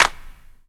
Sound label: CLAP, Dance Drums